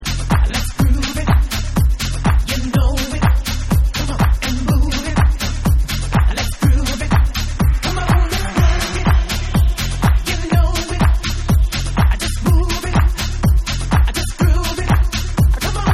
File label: Instrumental Remix